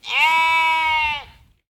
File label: Sheep Baaing Norwegian Sheep Expressing Itself Concisely